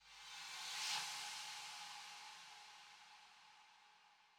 Heavenly Kit 137BPM Reverse Fx, A major; Sound FX

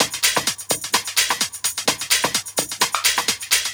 House Loop, 128 BPM; Vengeance Samples

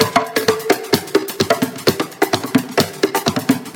Latin House Loop 128 BPM; Vengeance Samples

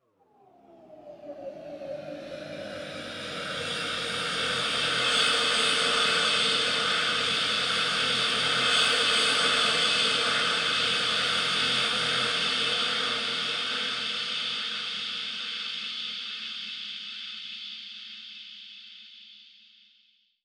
Pad; Dubstep Sample Pack

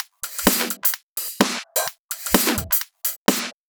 House loops 128BPM; Vengeance Samples

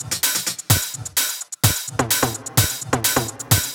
Minimal Loops

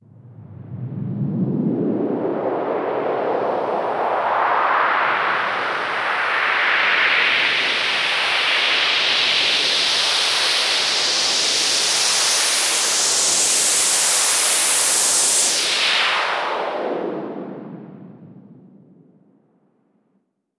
Sweeps Long

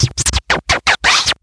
Vinyl FX and Scratches, FX Scratch

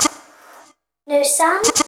House Loops, Vocal Loops House 128BPM

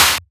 snares; Vengeance Samples

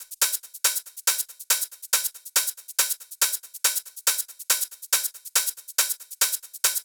140BPM Genetic Kit

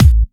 Bassdrum; Vengeance Samples

